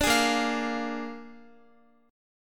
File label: Bb 7th Bb down Guitar 4E,4A,5D,5G,5C,6F; Bb7 strummed